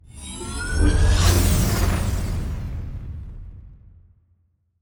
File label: ship colossus move; synthqueen; Stellaris; machineage